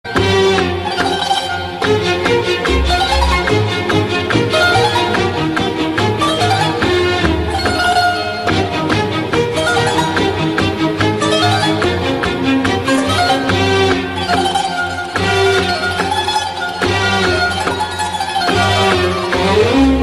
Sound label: Libyan music